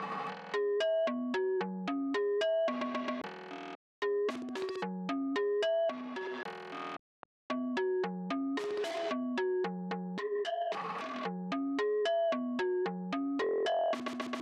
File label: random synth fx blocks